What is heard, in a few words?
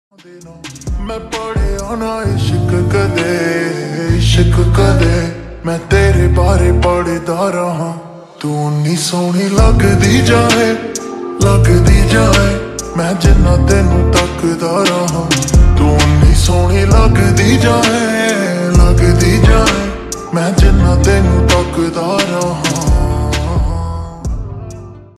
Slowed Reverb Ringtone